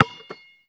basketball bounce pass; Call of Duty Modern Warfare

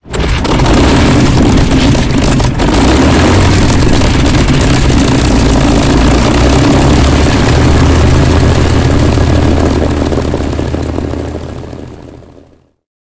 engine griffon start cockpit r; War Thunder

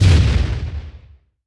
damage hits explosions, Warships

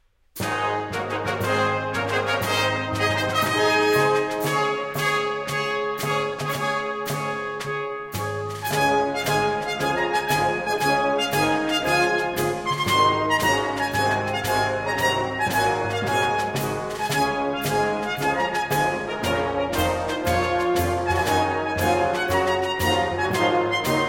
victory; italy; War Thunder

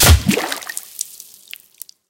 bullet hit water

